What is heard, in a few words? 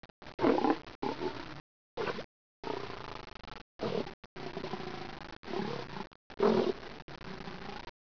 lion; 10,000 Graphics Pack Volume 1; Media Graphics International